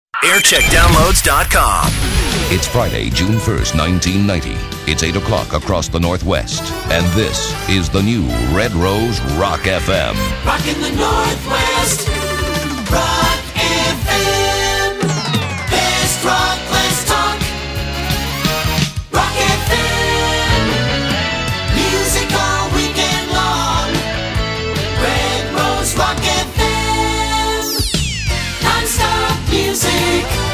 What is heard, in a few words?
jingles; rock fm